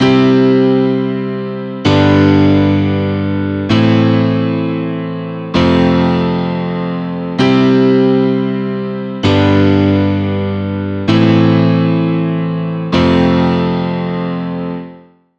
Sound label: chord